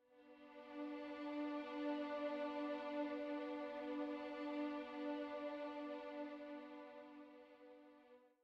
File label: Death & Rebirth Ending